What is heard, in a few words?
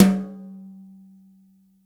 Snare Dry Funk